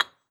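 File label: brick, steps; Terrarum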